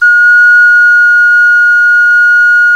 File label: CLAYFLUTE, Heart of Africa